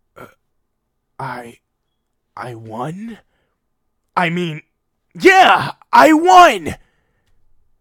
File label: game over